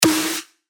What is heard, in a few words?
Snare Obliterate; revealed claps snares vol 2